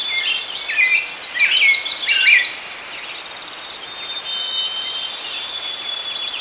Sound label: forest day ambience